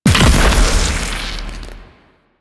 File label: Octopede Oblivion Proj By Explosion; Warframe; Man In The Wall